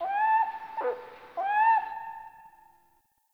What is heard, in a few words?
Forest Bird; Ambience; Warframe; Grineer